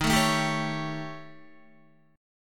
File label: D sharp 7th Flat 5th, Guitar; strummed; JGuitar